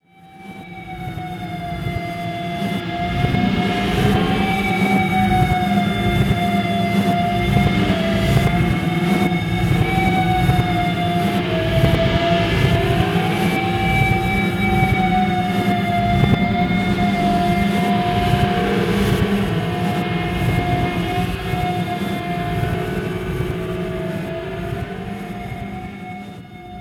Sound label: noise vib riff